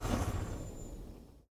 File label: vehicle van turnoff; teardown; ps5 windows xbox series xs